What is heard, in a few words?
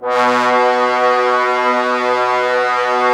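brass trombones B, Woodwind Brass Ensemble; 90 s Sample CDs; Miroslav Vitous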